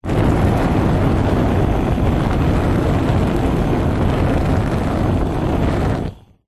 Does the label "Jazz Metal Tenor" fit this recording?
No